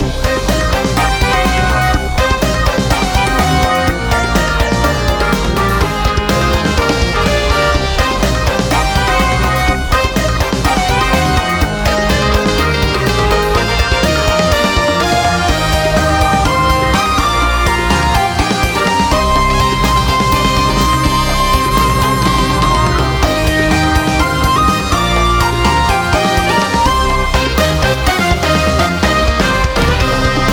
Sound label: Loop Dramatic; Baboon